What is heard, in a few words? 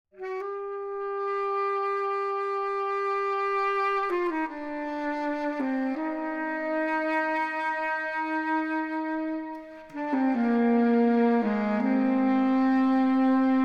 Sax Large; Verbtone